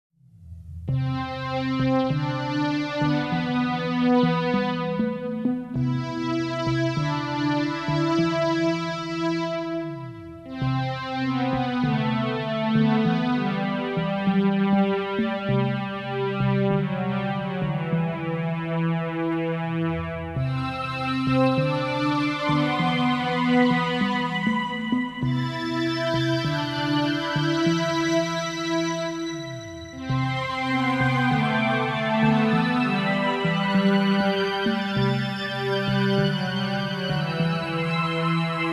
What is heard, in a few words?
jazz